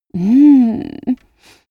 female moan; koerpergeraeusche; menschen; soundpool

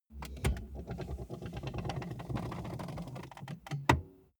car bmw e46 interior seat push back flutter

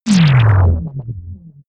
energy shield down; energie

